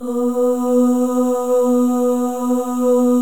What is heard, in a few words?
OH, FEM, Symphony of Voices; Spectrasonics